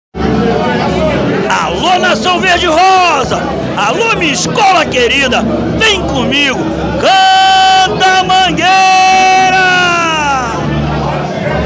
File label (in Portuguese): grito